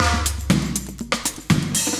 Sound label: 120, loops; Sound Effect Library